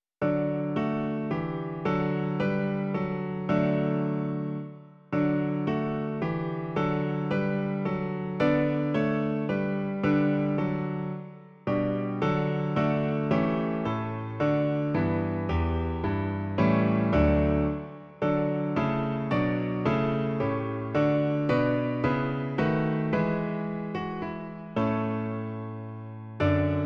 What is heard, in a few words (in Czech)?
klavír; Ó jak rád slyším; Klavírní verze